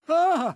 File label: Old man scream